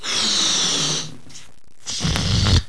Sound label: ballon blow up out